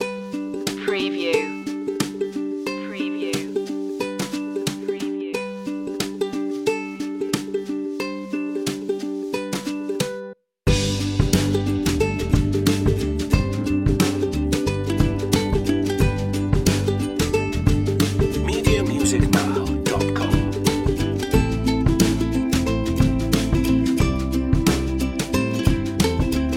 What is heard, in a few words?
inspirational ukulele